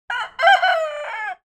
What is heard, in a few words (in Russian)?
petuh korotkoe nezakonchennoe penie